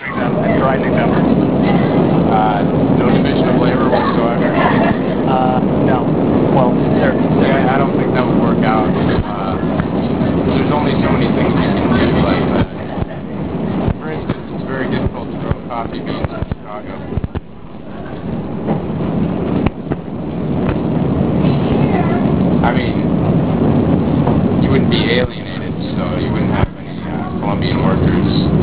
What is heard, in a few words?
train